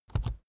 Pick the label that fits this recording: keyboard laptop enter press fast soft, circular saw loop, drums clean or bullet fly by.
keyboard laptop enter press fast soft